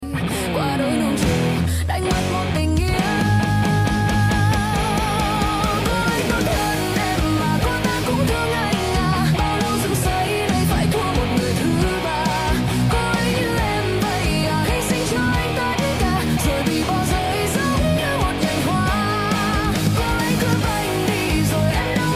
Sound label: rock guitar cover; nhac chuong